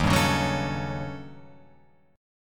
Eb 7th Sharp 9th Flat 5th, Eb, Guitar, Open F; 3,2,1,1,4,4; Eb7#9b5 strummed; JGuitar

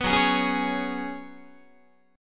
Gb Suspended 2nd Suspended 4th, Guitar; Gbsus2sus4 strummed; 14,14,11,11,12,12

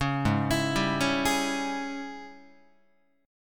G sharp Major 7th Suspended 4th Sharp 5th, Guitar; 4,4,7,5,5,7; JGuitar

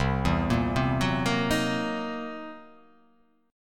C 7th Sharp 9th Flat 5th C; arpeggiated; JGuitar

